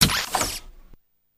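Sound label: Door Button, switches; Babylon5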